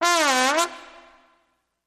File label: Trombone Medium High Gliss Down and Up